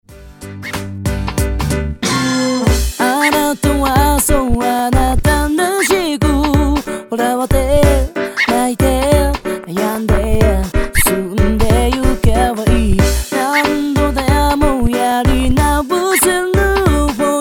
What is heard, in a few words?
music